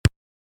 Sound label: kick; Basketball games; Multiplication of five digits